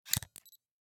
Camera, Pentax KS2, DSLR, electronic, mechanical, ready shot, flash up, focus, quick; Eiravaein Works; Vaeyan IV